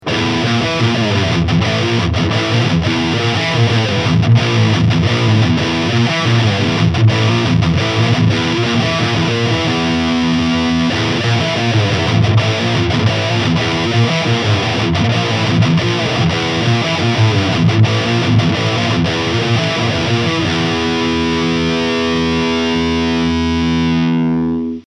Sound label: High Gain; Solar Guitars E1.6AAN